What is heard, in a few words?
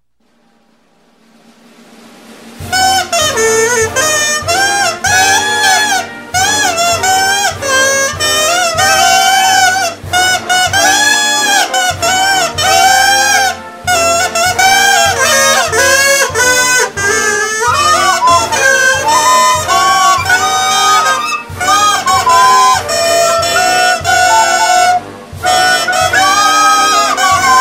NATIONAL ANTHEM, SHITTYFLUTED; USA; THE STAR SPANGLED BANNER